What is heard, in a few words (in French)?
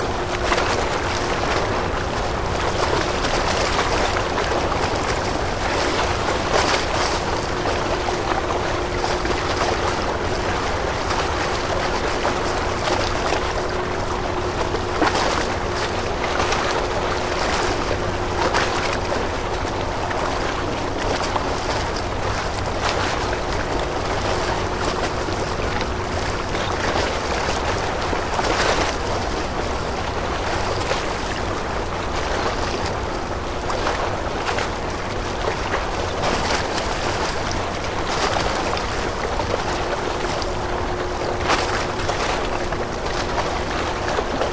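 Mer clapotis dans petit port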